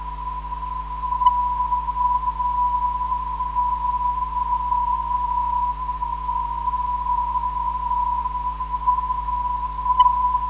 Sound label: 490kHz, NDB